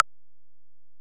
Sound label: Ac Perc, Drum Machines Alesis DM-PRO